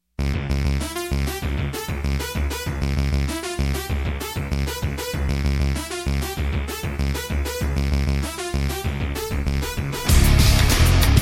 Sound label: trance